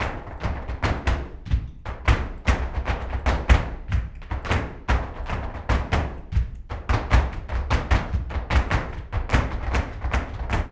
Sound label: flamenco tapping, alegrias